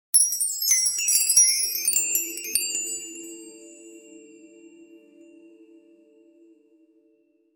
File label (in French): voix mystique carillon; zen